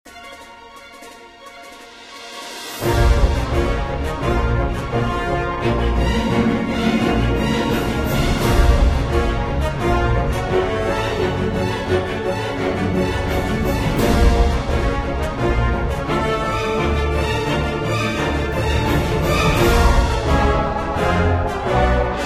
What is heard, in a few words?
Emerald City Music Loop; Temple Run Oz; android